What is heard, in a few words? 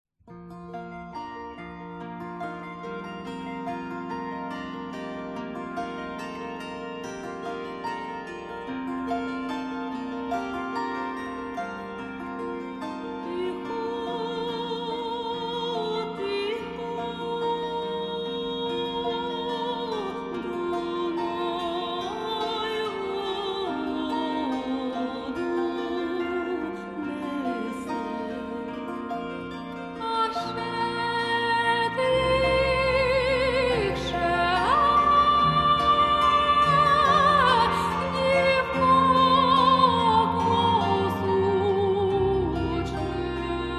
Trio bandurystok; Ukrainskoho radio